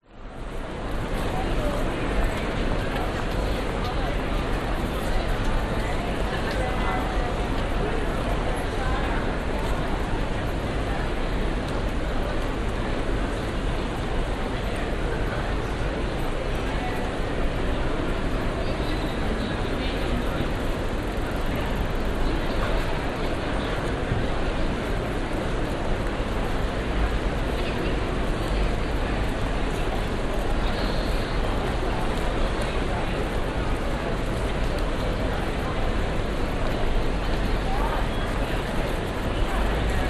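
public places city street ambience pedestrians busy queen street brisbane binaural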